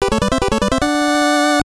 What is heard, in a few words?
Received Item; Fire Emblem Ankoku Ryuu to Hikari no Ken